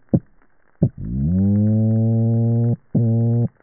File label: Amphichthys cryptocentrus Boop Grunt Swoop